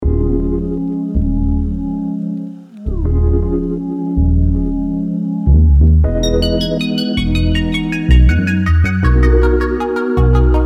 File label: Groove